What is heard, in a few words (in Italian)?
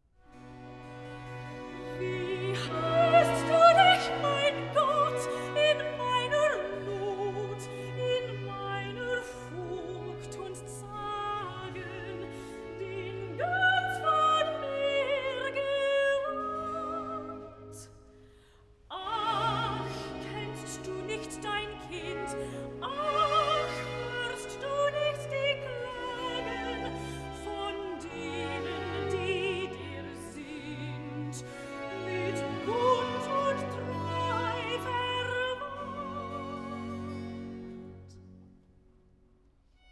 Recitativo (Sopran); Bach; Cantatas; BWV021; Ich hatte viel Bekьmmernis; Wie hast du dich Mein Gott